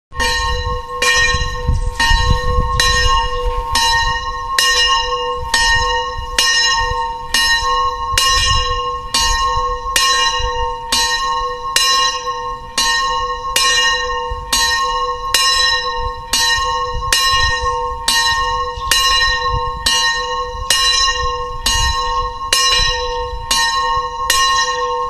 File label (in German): Gelaeut; Harbke